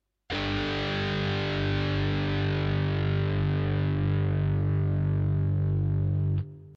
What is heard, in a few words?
This is the sound of a G5 power chord played on; Power Chord Lesson